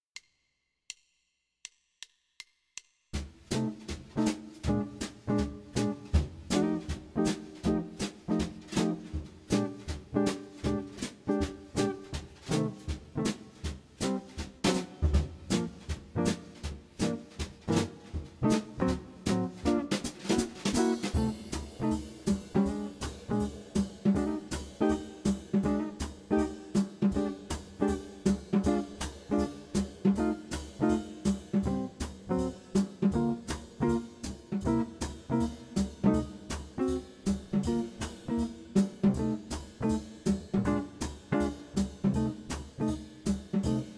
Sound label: Solo Backing 160bpm